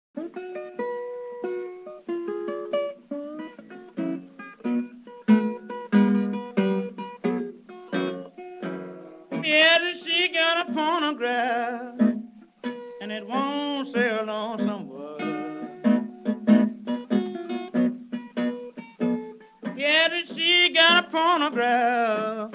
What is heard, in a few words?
Blues